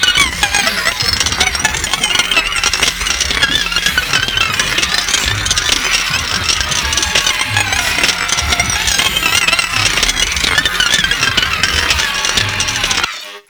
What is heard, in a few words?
Proto Infested Mutalist Speaker Full Broken Attack Scream; Warframe; Abilities